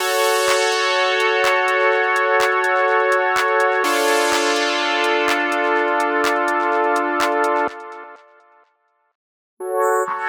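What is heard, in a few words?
spectral bright pad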